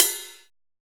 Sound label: ride cymbal drum sample f key